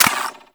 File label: Fire End, Weapons; Warframe; Braton Prime